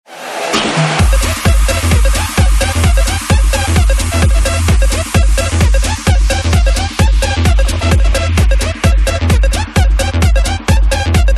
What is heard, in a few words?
Electro